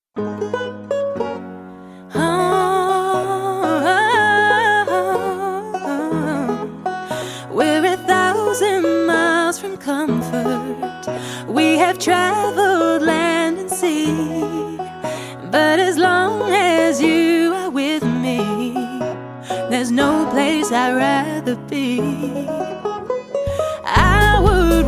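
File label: Vintage Folk Band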